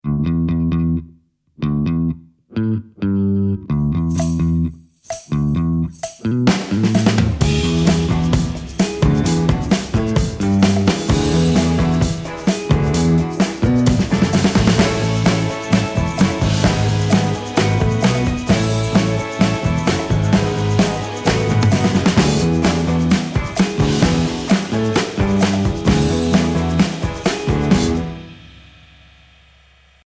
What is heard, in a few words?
music Ping Pong; Vinted; Decluttering 101